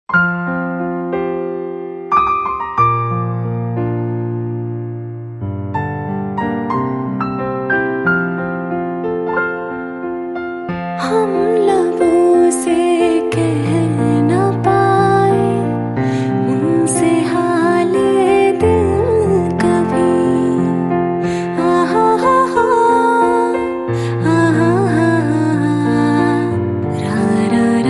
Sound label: Female Version